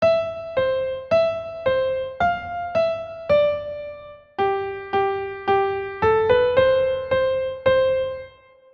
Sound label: melody